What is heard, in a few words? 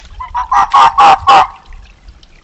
red fox vulpes vulpes